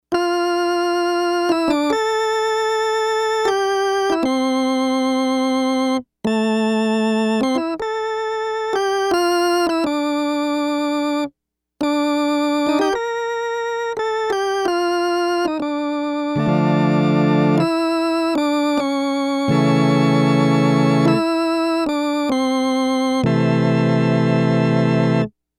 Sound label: Vox